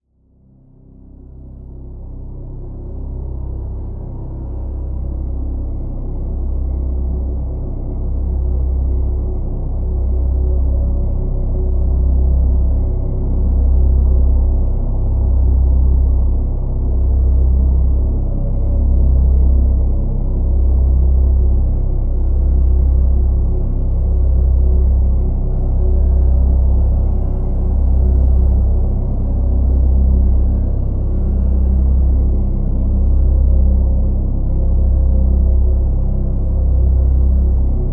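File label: Drone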